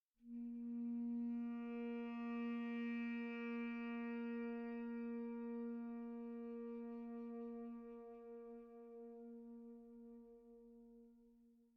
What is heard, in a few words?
Env Sky High Altitude Bass; gamerip; The Legend of Zelda Tears of the Kingdom